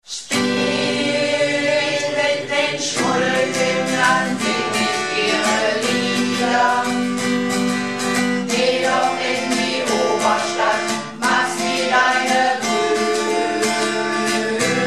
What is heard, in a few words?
Bass